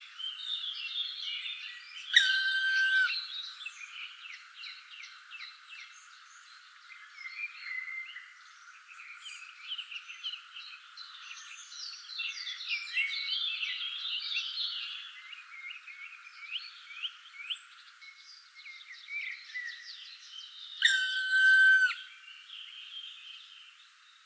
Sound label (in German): Schwarzspecht; Ornithologie